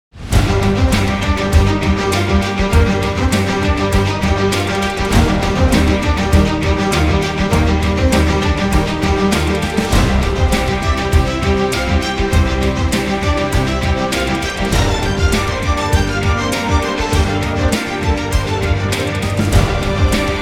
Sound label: News Mix No Melody; CBS